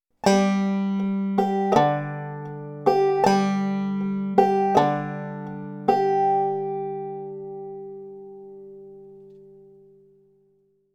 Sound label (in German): rest strokes und bum ditty